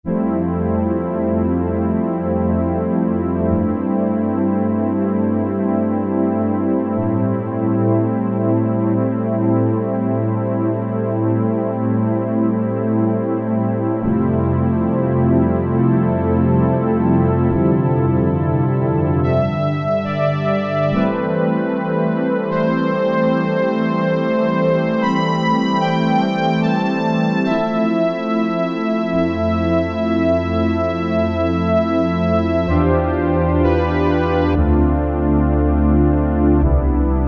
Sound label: Darkpad, Yamaha TG77; Factory Presets